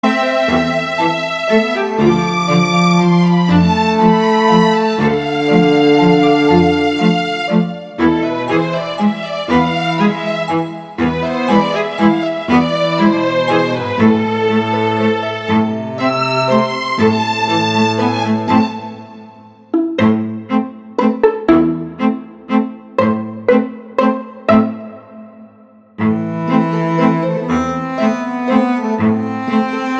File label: Waltz Atmospheric Retro